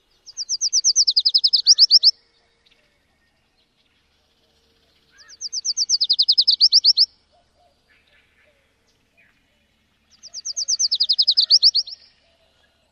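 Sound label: songs, bird; AZ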